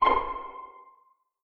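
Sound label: string pizzicato C5 pianissimo left; Sound On Sound - Peter Siedleczek's Orchestra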